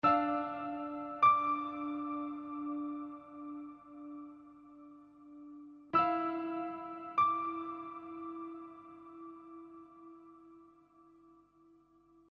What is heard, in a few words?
piano, ambient